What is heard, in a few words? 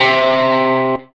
electric guitar